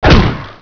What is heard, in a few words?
claw strike